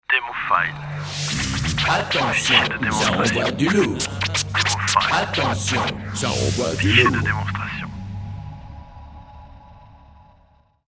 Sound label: Scratch